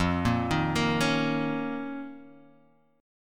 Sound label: F 7th Suspended 4th Sharp 5th; F7sus4#5; arpeggiated; JGuitar